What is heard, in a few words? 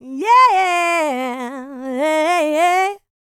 licks, vocal, dance; ILIO; Vocal Planet